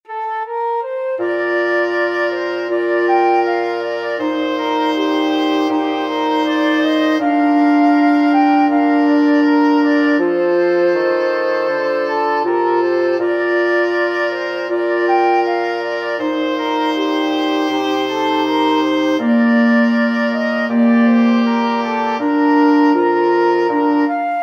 danny boy, wind quartet

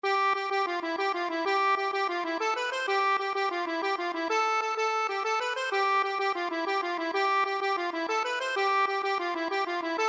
accordion

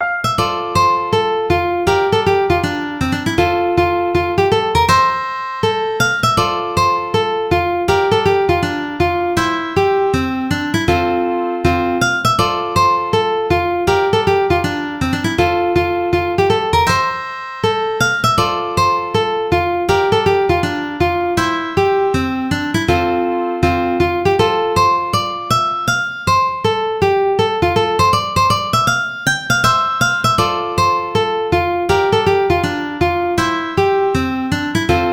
ukulele

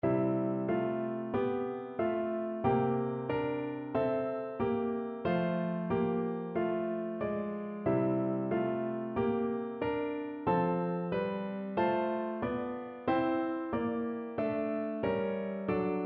cradle song PNO, piano

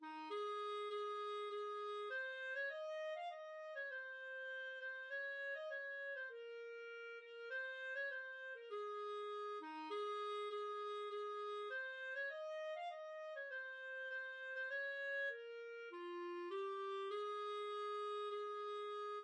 big ship, clarinet

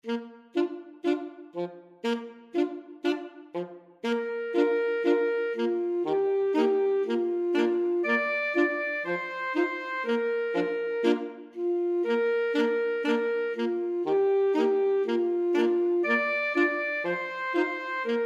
old macdonald, alto sax quartet

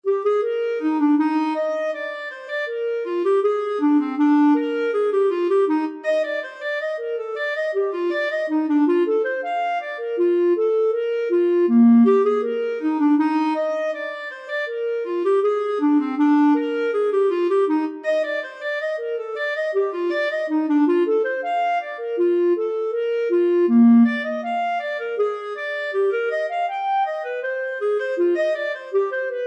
bourree, clarinet; bach

